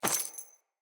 Crate Coin Drop; Objects; Pickups; Neathuns

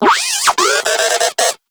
Moa Compassionate Vocal Stomp; Warframe

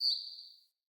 Bird Single Chirp; Galene Bird

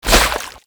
Sticky Ink Tentacle Whip; Gameplay; Mutator; Warframe; Shadowgrapher

